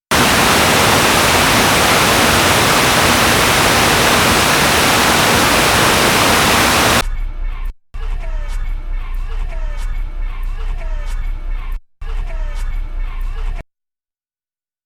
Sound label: Brasil Mix